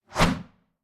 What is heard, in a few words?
swing blade 2 hand